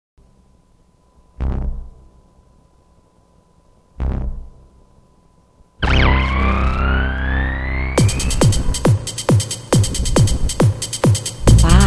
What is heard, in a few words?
kick